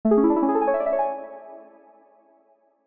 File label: tone; Valentines; seasonal